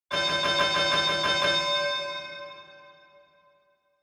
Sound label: Boxing Bell